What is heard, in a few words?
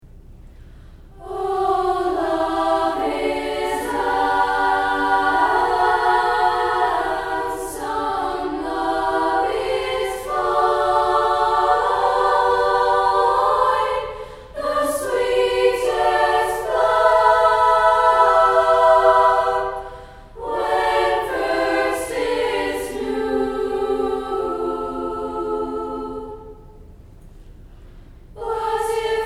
choir; spring concert 2015